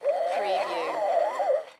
Shower Laundry Line; sound effects bible

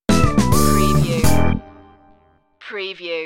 do it funky stinger

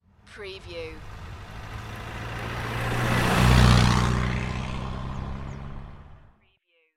Diesel car drive-by, moderate speed close range; beanstalk audio

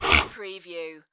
Horse Stallion Snort; Sound Effects Bible

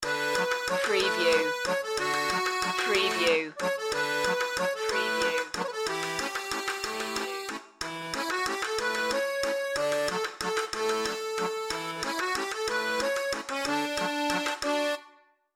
carnaval de paris

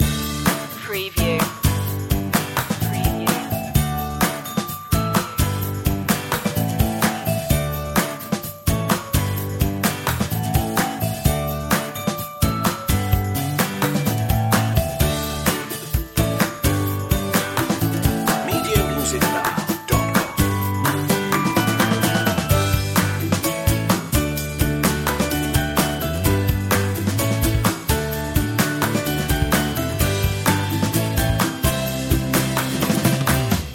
lucky loop

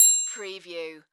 Multimedia Bell

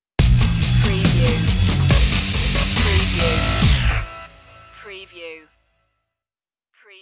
anvil stinger

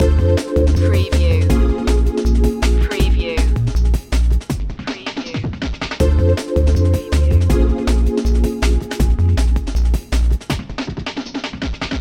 town beat loop

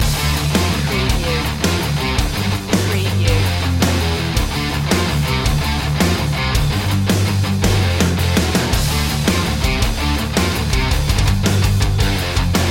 battle ready loop

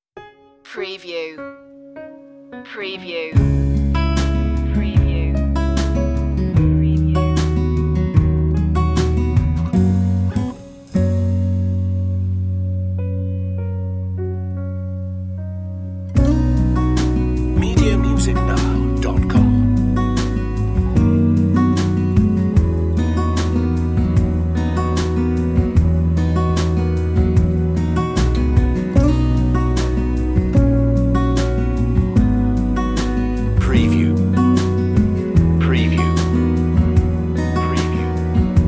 instrumental

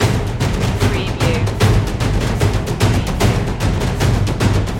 epic percussion loop

